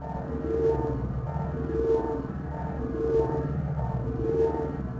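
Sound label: lifter Claw loop; machines mechanical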